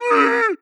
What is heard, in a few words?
warning, zombie vox; creatures